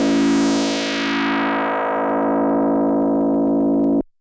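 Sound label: DX100 C#3; rocket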